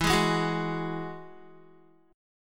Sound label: E Major Add 9th E, Guitar; strummed; 4F,4A,5C,5G,5C,6E